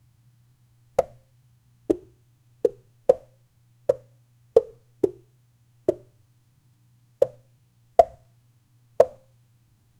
schoosh schoosh schoosh, movement

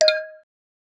ui sound to select; mythology

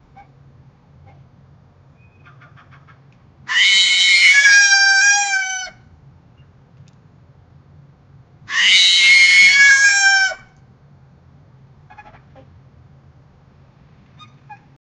the sound of a cheetah

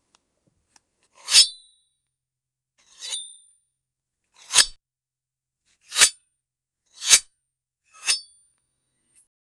sheathing a sword

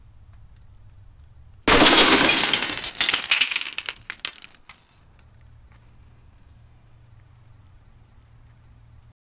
big 10000 pieces lego, lego

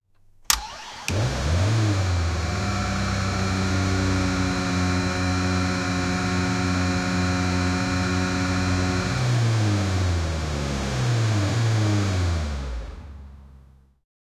3 cylinder engine

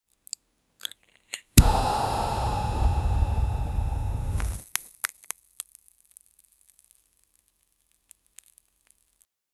deep inhale of smoke, smoking

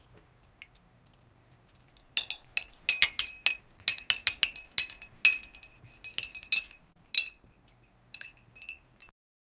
cat movement skittering c

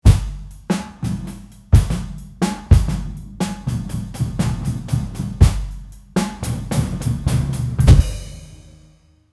kick drums for a jazz

